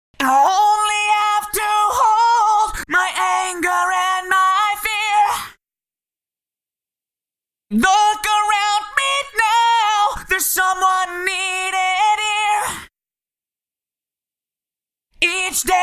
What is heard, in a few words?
Eventide Instant Phaser Vocals; Eventide Model H910